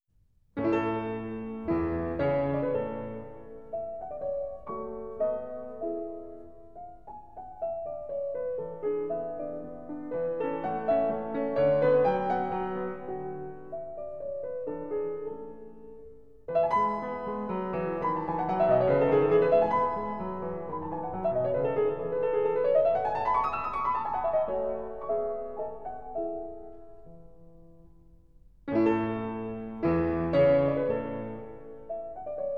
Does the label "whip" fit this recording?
No